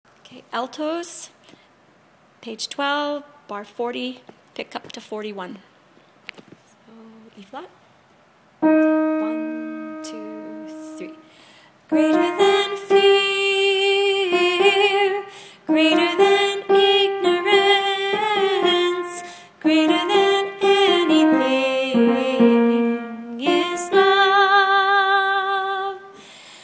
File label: Altos